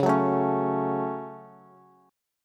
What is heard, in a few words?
Db Suspended 4th Sharp 5th, Bass; strummed; JGuitar